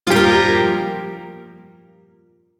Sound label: Shawzin Blues Chord; F; Warframe; Duviri Shawzin